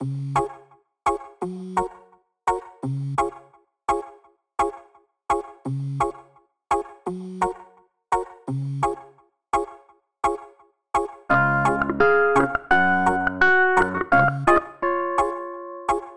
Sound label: happy sautillant pluk; archibald editions